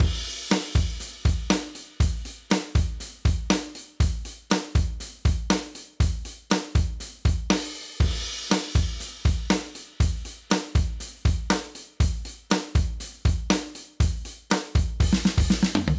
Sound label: Drums